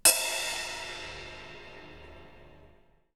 crash cymbol, percussion

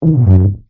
strangled fart, tuba

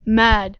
mad, vocals

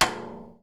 tom side slap

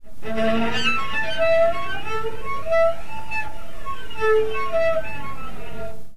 harmonics, cello

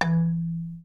marimba B, pitched percussion

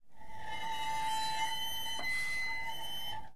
cello whale

